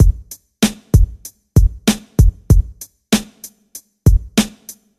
96 bpm 00s rap drum loop f sharp key

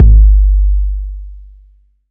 round bass drum one shot G key; kick drum samples

